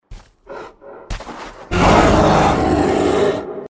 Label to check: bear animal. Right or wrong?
Right